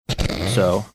snorting

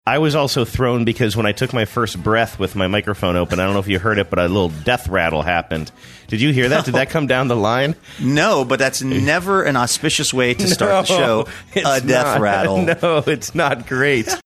little death rattle

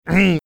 clearing his throat

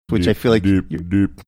singing doop doop doop

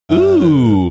"ooooh"

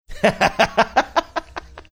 laughing

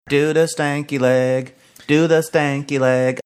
singing

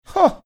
"huh"

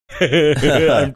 funny laugh

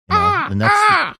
making pterodactyl sounds

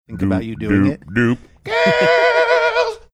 singing doop doop doop doo wop style

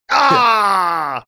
aaahh

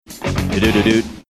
singing da doot da doot

